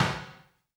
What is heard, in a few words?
tom, dance drums; Best Service; Dance Mega Drums; TOMS